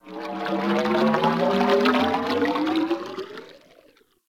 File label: Ocean Cloning Machine Gurgle; Ambience; Props; Warframe; Grineer Ocean